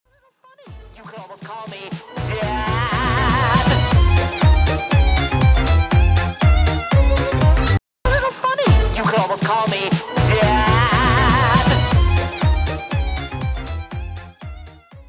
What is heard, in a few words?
violin part; hazbin hotel